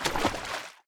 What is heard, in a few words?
footsteps, slosh; player